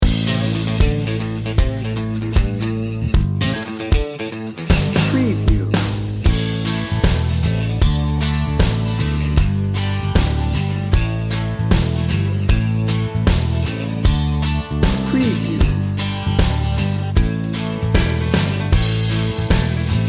americana heartland bed

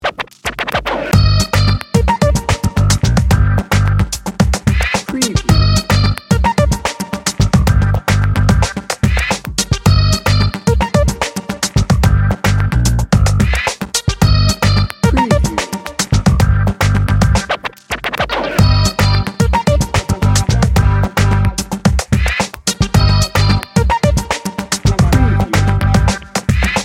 modern fashion

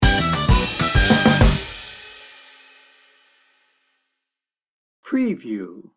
irish jig sting bumper

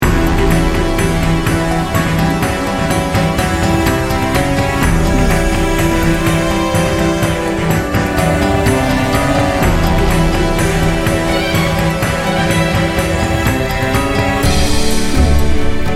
heroic drama action

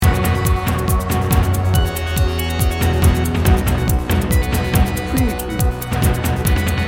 victory loop; Upbeat and driving. Features a Pasodoble feel with Maleguena style brass and string melodi

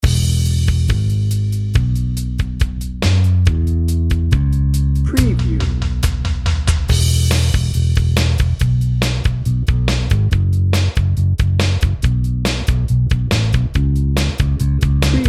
optimistic pop rock dnb

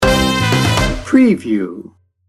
tropical festival sting bumper

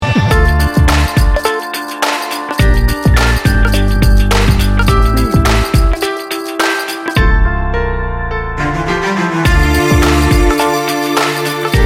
urban chill

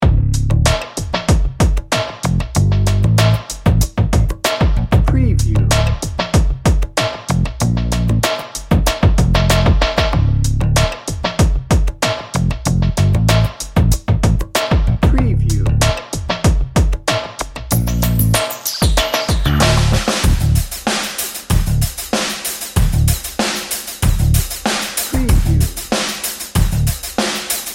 dnb

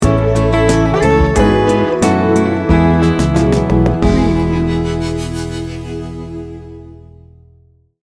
heartfelt home sting bumper

